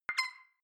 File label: click whistle up high, signale